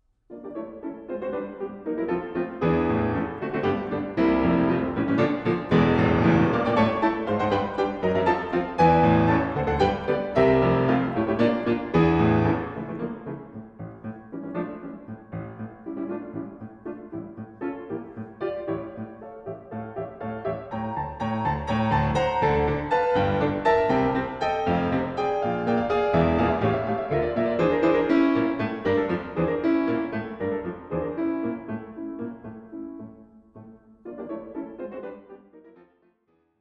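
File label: piano sonata g minor; stenhammar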